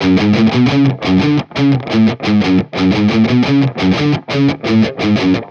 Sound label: Metal Guitar Loop, 174 BPM F# Maj; Cymatics; Vibrations Guitar Sample Pack